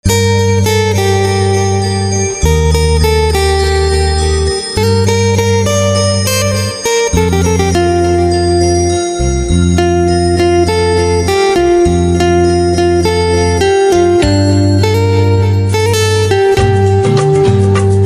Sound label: Guitar